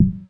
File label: Wrap Boundary Alternate mono, iOS Sounds